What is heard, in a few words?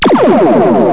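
pulsar, space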